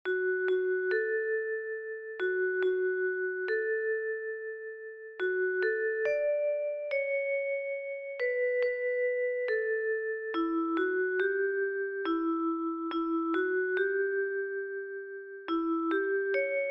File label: Brahms Lullaby key D Major; Maestro(Android application)